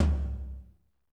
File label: tom, lite jazz, Roland